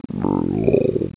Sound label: croak; Princess and the Pea; SoftKey